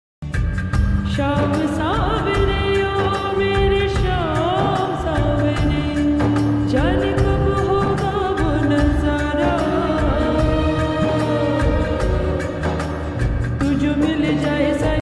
Krishna instrumental ringtone Krishna flute music ringtone; krishna radha